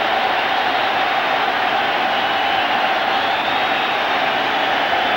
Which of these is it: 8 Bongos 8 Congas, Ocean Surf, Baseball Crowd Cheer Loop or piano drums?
Baseball Crowd Cheer Loop